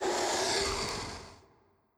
Drac Fire

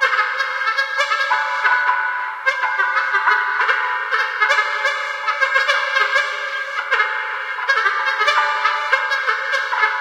Music Loop; Infinite Hole; Music Loop 8

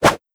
Ice Throw

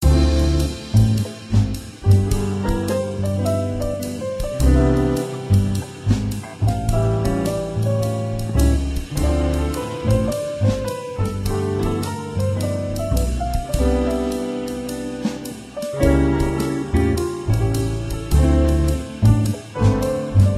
Blues and Jazz Song; menherafflesia flowering abyss; android

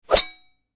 metal whoosh hit

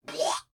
Blob Death

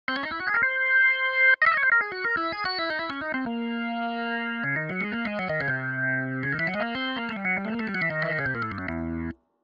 Final boss Organ Solo; music

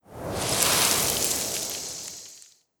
Cursed seeds

